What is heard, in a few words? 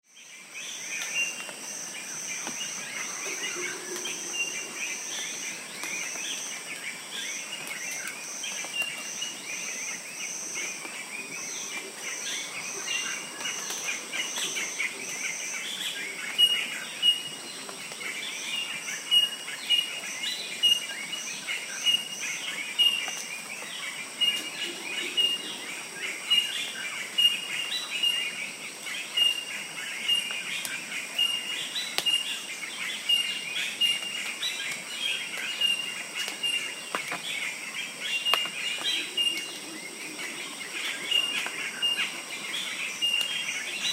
morning sound Sinharaja; Sri Lanka